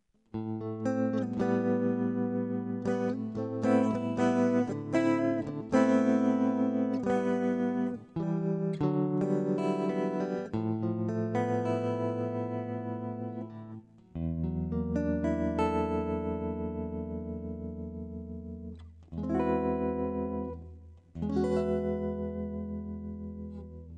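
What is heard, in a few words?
tremolo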